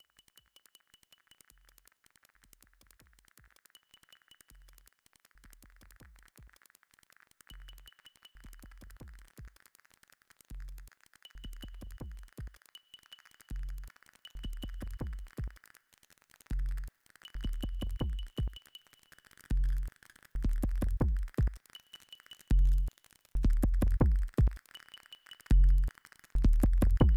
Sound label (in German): werther menuettbeat